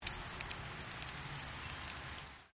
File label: Wind Chime Japanese Bell Type; Various windchimes; Musical